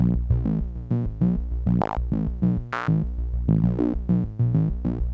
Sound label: MINIMOOG; AMG - Vince Clarke Lucky Bastard